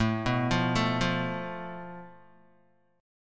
A Suspended 2nd Sharp 5th, Guitar; Asus2#5 arpeggiated; JGuitar

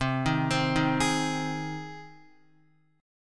C Minor Sharp 5th, Guitar; arpeggiated; chordshape

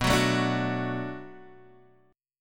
B Minor 7th B Guitar DADGAD, chord

